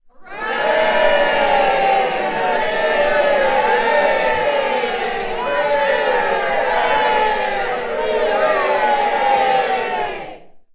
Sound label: Crowd Hooraying; Crowd Sounds / Crowd Ambience